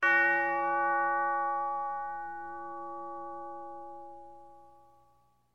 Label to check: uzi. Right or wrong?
Right